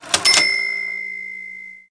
cash

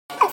oomf

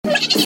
wahahahah

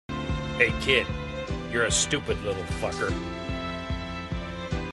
kid

